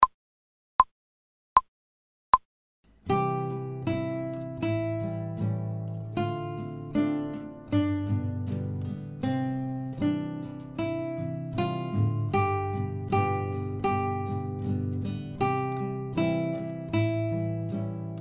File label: slow, guitar; without metronome; Lightly Row